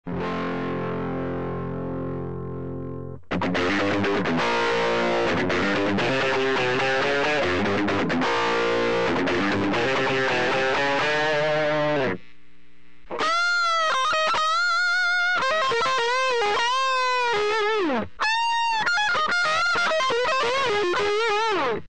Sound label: Boost, Set to low Gain, then high, Strat; Strat - effect - Bulldog Cab Sim - sound card